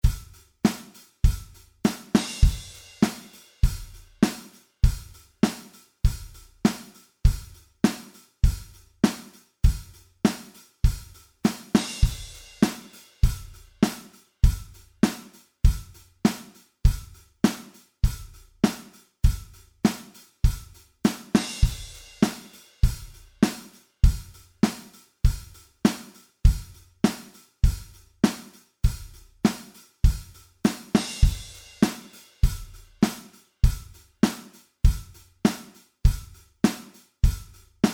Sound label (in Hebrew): תופים 100 BPM; ליווי תופים 100 bpm